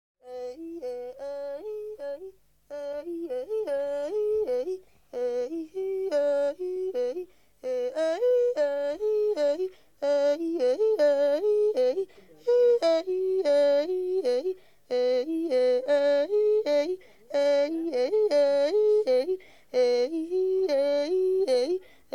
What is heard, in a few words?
Solo Yodel